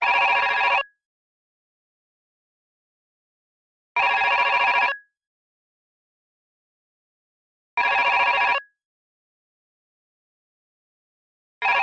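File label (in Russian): отвратительный дешевый телефонный звонок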